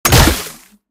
Toxic Shot

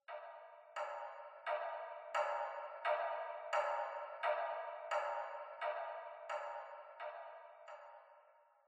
Clock Interior

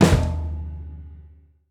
drum Hit; minecraft story mode gamerip 2016; Order of the Stone

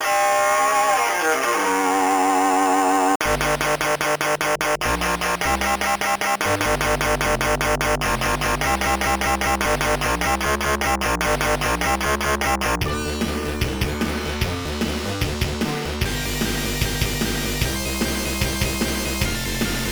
Punk Metal Band; zunzunkyou no yabou; system c 2; arcade

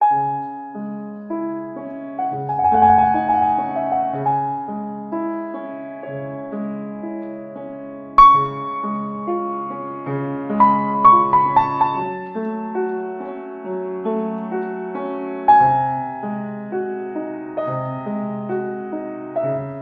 Piano; Assassin's Creed Syndicate

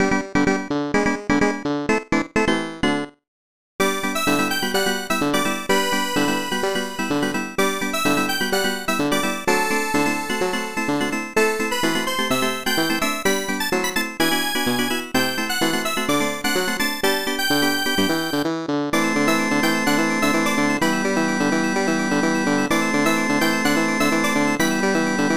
Game BGM (after death)

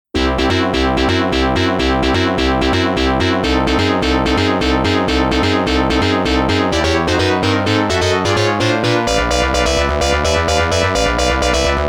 Stage 2 Boss BGM; Gun Smoke; Capcom Music Collection Vol.1

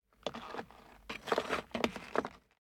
Footsteps debris; The 39 Steps

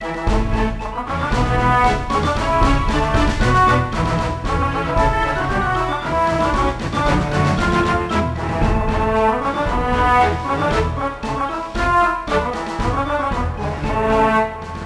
Duel Music Intro

